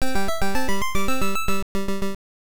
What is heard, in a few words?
Monster Bye-Bye; Dig Dug; NES